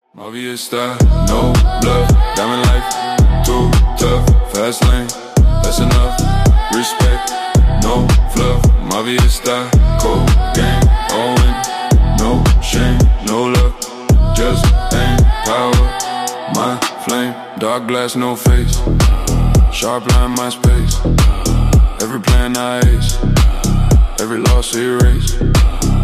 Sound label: trap mafia house mafia style